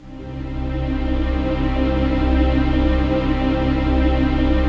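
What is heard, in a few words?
trance pad; Best Service; XX Large - Pads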